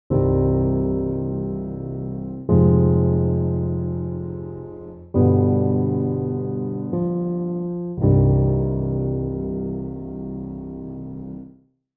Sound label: comparison ivory german d midi